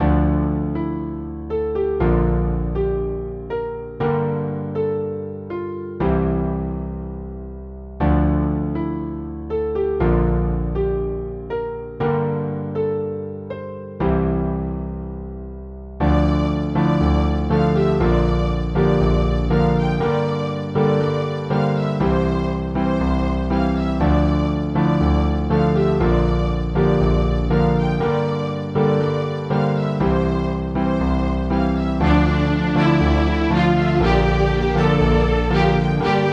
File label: cinematic adventure soundtrack